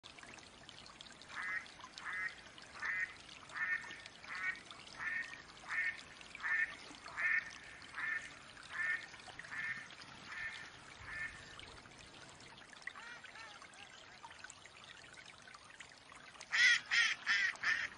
Mallard duck quacking call sounds Female Hen; usa; the iconic waterfowl of the usa

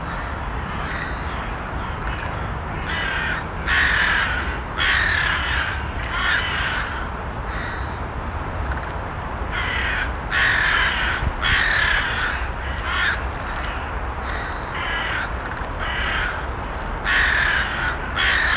Crows Loop, american crow; usa; a clever avian buddy

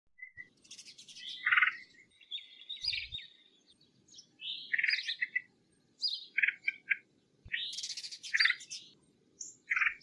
Red Headed Woodpecker Call; usa; the flashy drummer of the forest

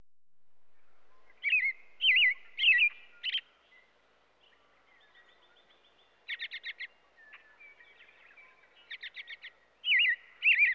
Bird American Robin; usa; a sign of springs arrival

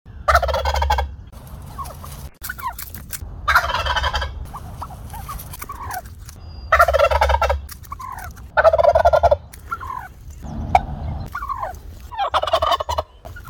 Wild Turkey Sounds; usa; birds of the usa

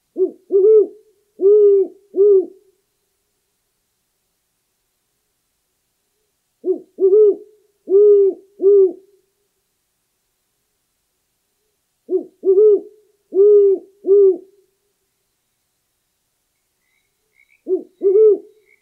Great Horned Owl Sounds; usa; the nighttime ruler of the usa

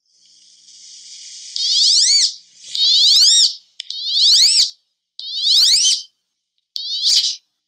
Wood Duck Call; usa; the vibrant wood duck; a jewel among birds of the usa

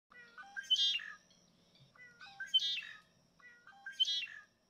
Red Winged Blackbird Calling Sounds; usa; birds of the usa

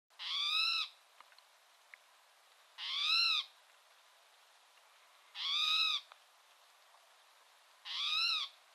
Spotted Towhee Calls; usa; a hidden gem among the birds of the usa

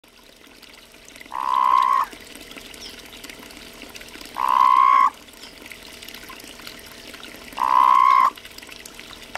Sonoran Desert Toads Call; usa; desert animals of the usa

